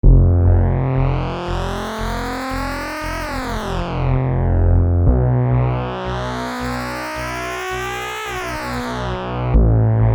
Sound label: Keyboard